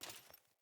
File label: Movement Armour; Characters; Enemy; Foley; Sinecure; Melee Grunt